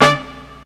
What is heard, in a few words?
tuners